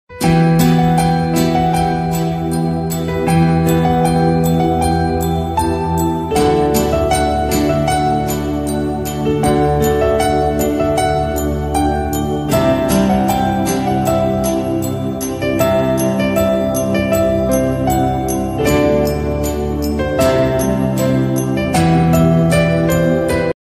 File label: instrumental ringtone slowed ringtone